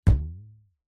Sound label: foley elastic fabric strip ping